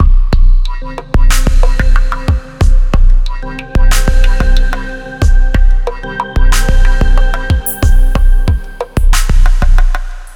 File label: dub